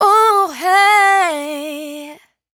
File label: riff Bluesy D, Vocal, Dry Vocals; Raw Vocals; Slate Digital Omnivox Vocal Sample Pack